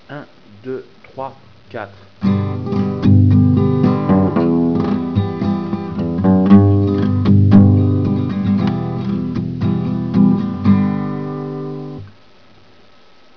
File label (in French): RUMBA, guitare